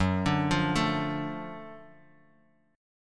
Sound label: Gb Minor Major 7th Sharp 5th, chord; arpeggiated; 14,17,15,14; JGuitar